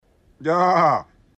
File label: YAAA